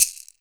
MARACA; Drums&Percussion; E-MU Producer Series Vol. 7 – Old World Instruments

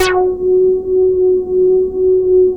JUNO 106; Masterbits; Vintage Voltage; SEQ-FX

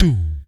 VOC DRUM, MOUTH PERC; Best Service Hyper Dance

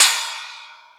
Metal Drums, E-MU Pro-Cussion; drum machine

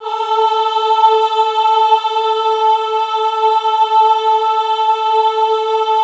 AHH A3, Classical Choir; Best Service; Extended Classical Choir; AHH MIX

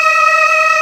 MELLO CHOIR; DANCE; WORS STATION; DANCE #3

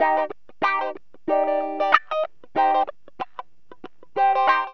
GTR, CHANK; Zero-G; Funky Elements

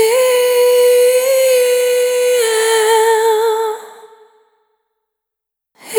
Single Voice Melodic Loops; Sony Creative Software; Pocket Diva World Class Vocal Samples; Discovery

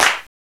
CLAPS, FAIRLIGHT CMI IIX, PERCUSN; PERCUSN1

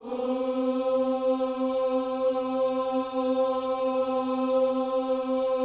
OOS C, Vocal Gospel

